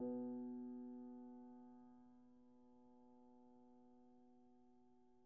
piano pp, steinway

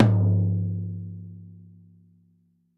tom lo, gretsch kit

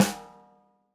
snare, gretsch kit

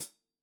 hihat foot, gretsch kit

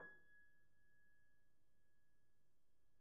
piano pp, steinway

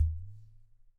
Udu Booyoo

